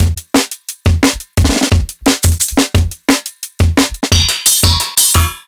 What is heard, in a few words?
Live Jungle Drum Break, 175; JungleBreaks24